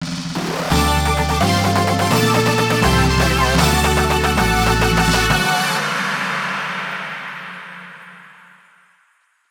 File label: fanfare; sea of stars ost